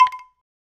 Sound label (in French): bambou echo